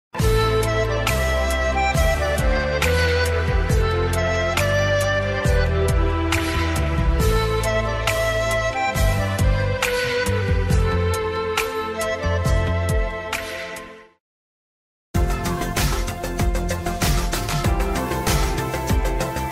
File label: saxophone love music ringtone